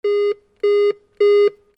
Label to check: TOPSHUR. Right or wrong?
Wrong